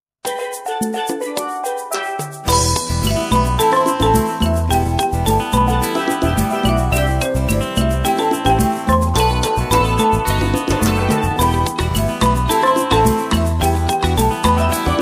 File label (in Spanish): calipsound con laminas